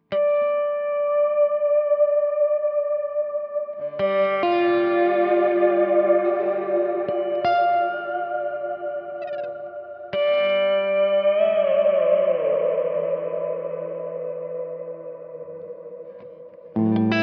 Reverb Swell

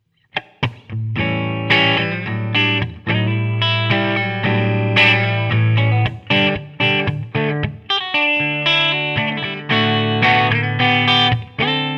Clean

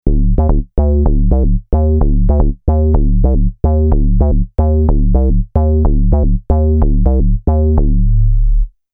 Square Bass

